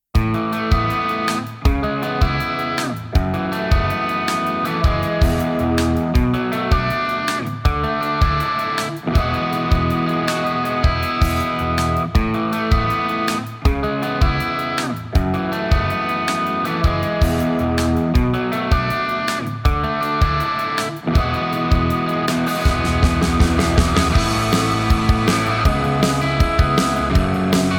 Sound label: Crunch Guitars On